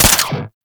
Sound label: Pistol Mech; Weapons; Warframe; Tenno; Temple Signature